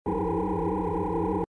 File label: super jump charge